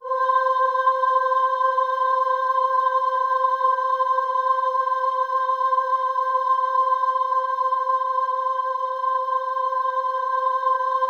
C5, Synth Leads

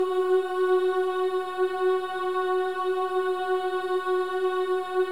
Boys; Spectrasonics; Symphony of Voices

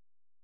A#, FX; Big Fish Audio

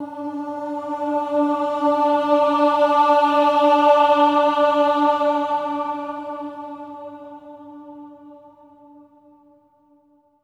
AH D4, swell ahs, Symphony of Voices; Spectrasonics

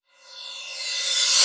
Reverse Cymbals

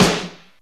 ROCK PERC.

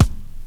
KIT, Dance Mega Drums; Best Service

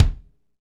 KIK FNK, Funk Kits, Roland; Northstar - Drumscapes Roland

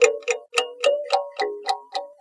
Mbira Kulfi, Acoustic Beats; Sonic Foundry (Sony Creative Software); Myths of Technology

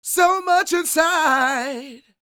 SING W, Vocal Planet Blues & Soul, BLUESY; ILIO

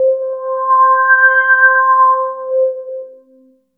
ORGAN C3; East West - Phat & Phunky

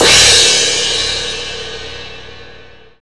CRASH2 B5; Greytsounds VOL-1; SDX HATS+CYM

